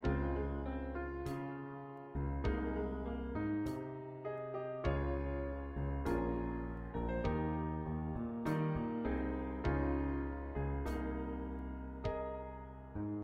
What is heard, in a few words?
Accompaniment